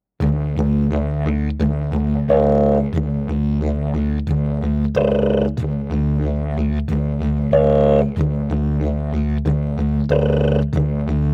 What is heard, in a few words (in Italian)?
ritmo livello intermedio